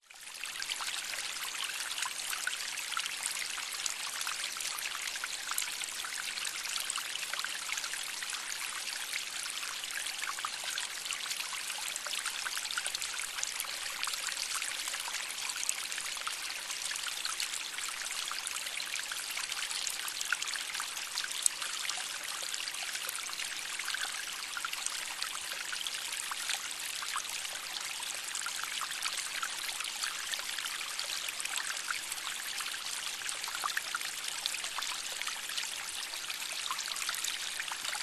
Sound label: nature waterfall cascading water trickling rocks